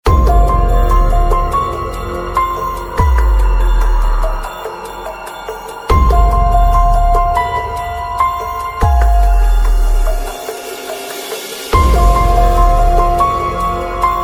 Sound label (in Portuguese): musicas para halloween musica de halloween assustadora; happy halloween 2022